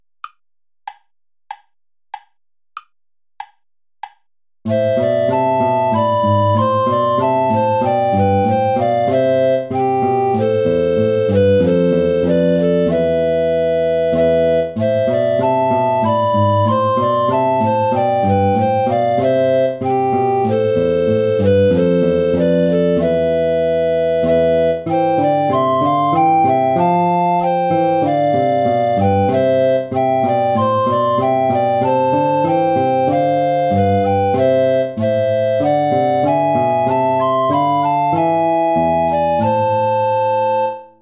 play along without tenor recorder; bach johann sebastian

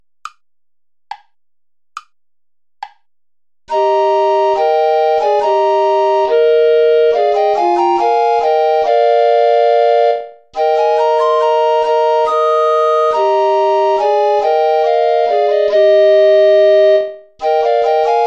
play along without guitar; haendel georg friedrich; tochter zion freue dich

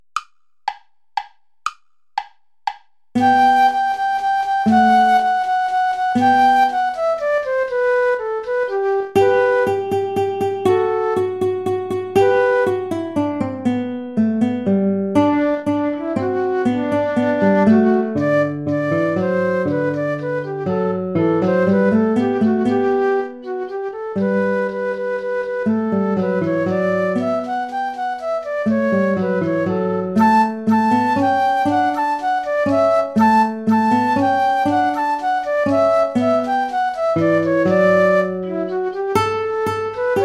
play along without guitar; alter ungarischer tanz

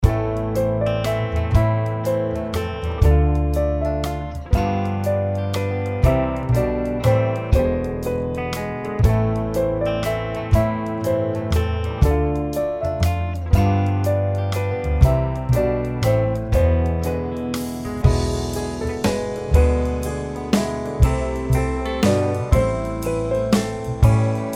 menuet; weiss leopold silvius

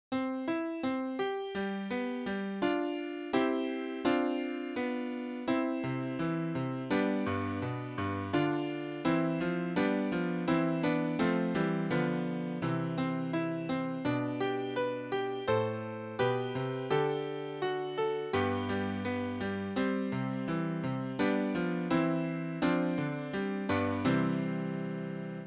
fuga major; speer daniel

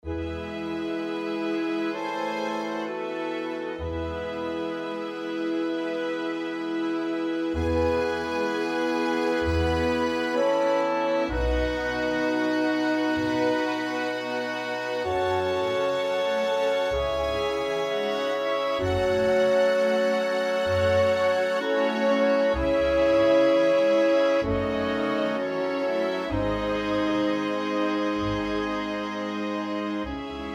intermezzo, for chamber orchestra; from fedora

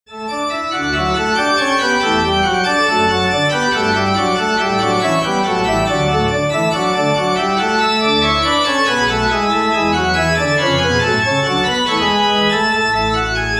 organ variations; the lord shepherd

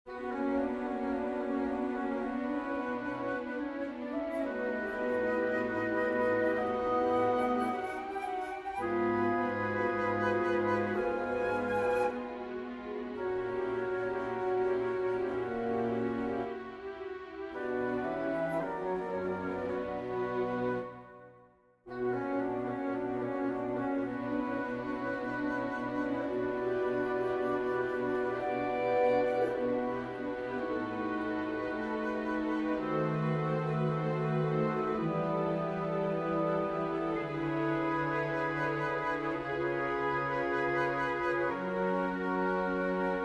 for winds, strings; For behold darkness shall cover the earth

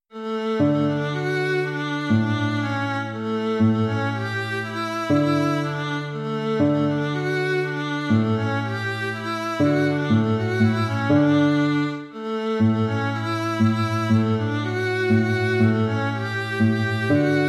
waltz; Carulli Ferdinando

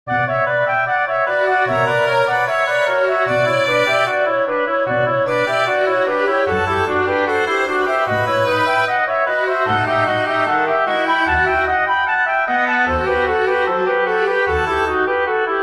recitative for woodwind strings; bach johann sebastian lieber heiland

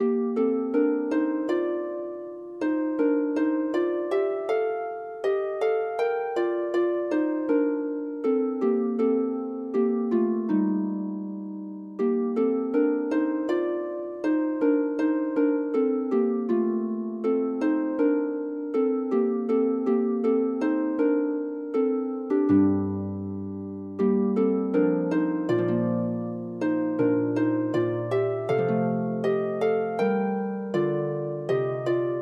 harp

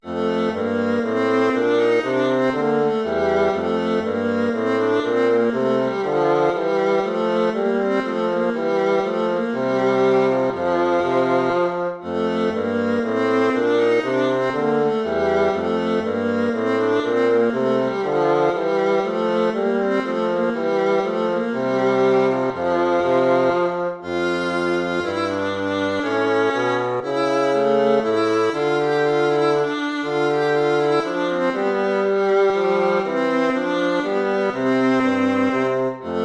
baroque minuet major

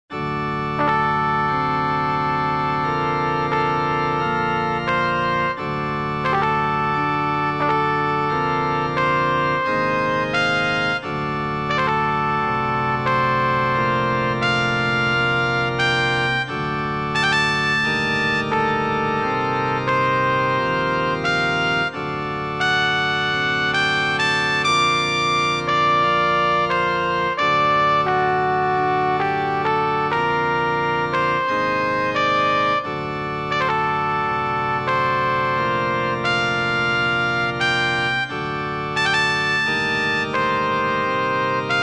shofar call

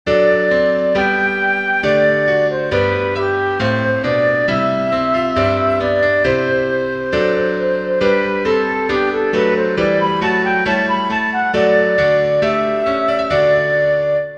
chorale for flute piano; bach johann sebastian; ich rief dem herrn meiner not

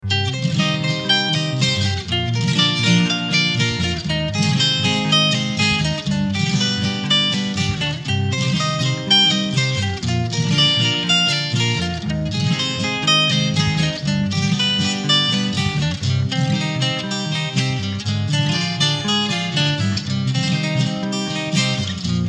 flamenco feeling

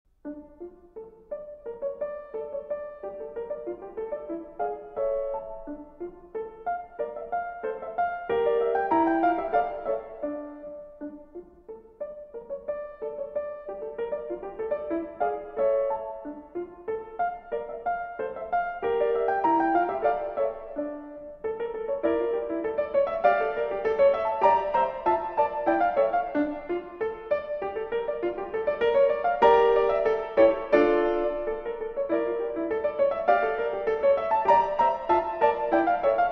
gavotte; Amy Marcy Beach

